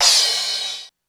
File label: Crash, Korg DSS-1